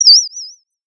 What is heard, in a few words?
Sound of a bird tweeting nicely